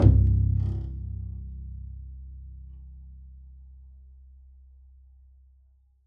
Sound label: pizz c1, double bass; dsmolken